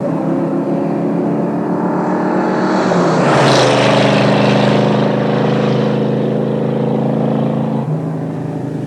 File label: Mustang By 75 Mph